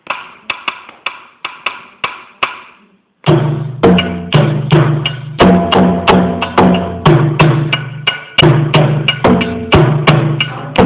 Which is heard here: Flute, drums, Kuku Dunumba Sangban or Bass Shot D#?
Kuku Dunumba Sangban